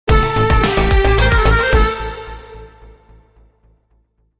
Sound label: Turkish Love (Modern Remix)